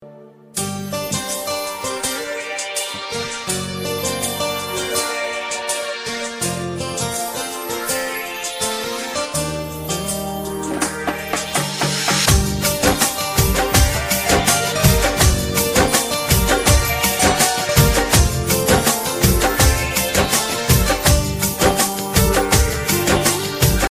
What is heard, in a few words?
valentine guitar ringtone